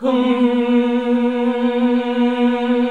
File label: HMM B1, CLASSIC VOX8